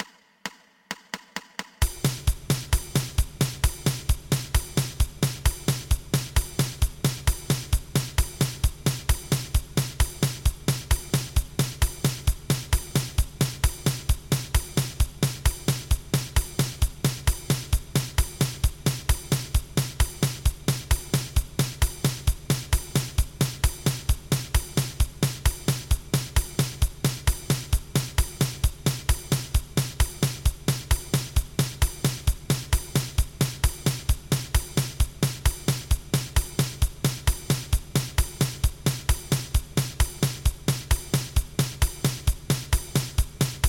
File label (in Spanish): 2 pulsos